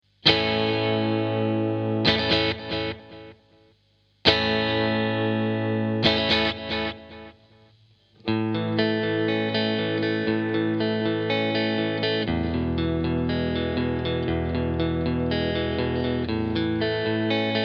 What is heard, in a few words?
dd-3 mod スイッチ右